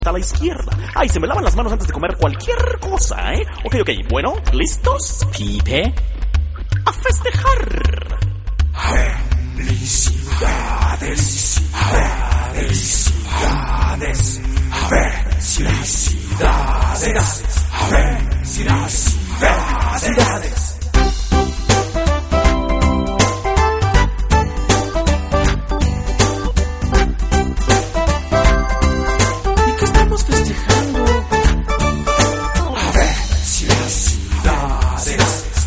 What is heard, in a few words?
pipe